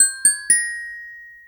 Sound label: Hovering Hawk